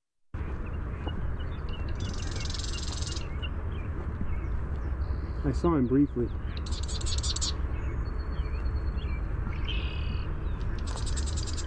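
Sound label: song, marsh wren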